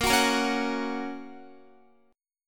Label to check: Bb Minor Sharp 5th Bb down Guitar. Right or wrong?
Right